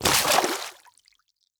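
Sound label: footsteps water run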